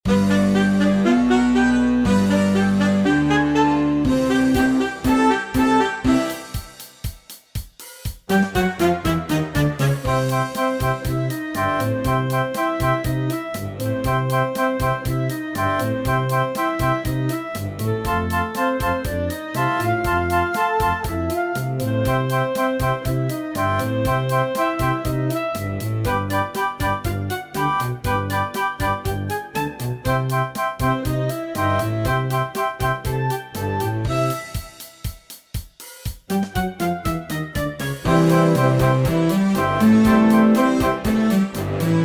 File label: rock and roll party